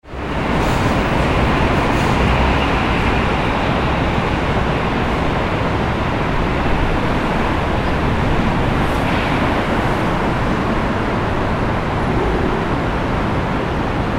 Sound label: Street